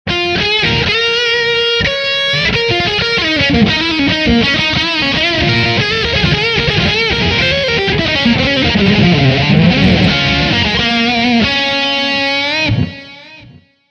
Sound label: LP Custom